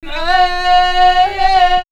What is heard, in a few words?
Ethno Vox Islamic Prayer